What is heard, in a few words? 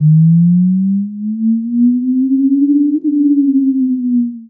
snipe power, weapons; Dystopia